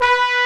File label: BONES, TROMBONES; Greytsounds VOL-1